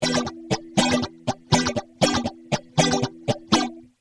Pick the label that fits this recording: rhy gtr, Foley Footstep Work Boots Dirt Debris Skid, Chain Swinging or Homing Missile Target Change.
rhy gtr